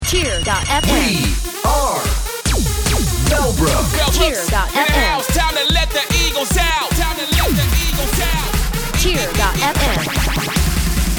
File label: Intro with loop; Bellbrook High School